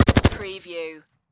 Machine Gun Heckler And Koch G36 Short Burst Close Perspective